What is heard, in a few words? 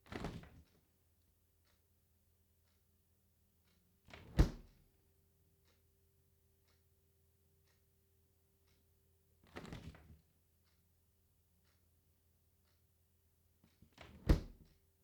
Fridge Door Open Close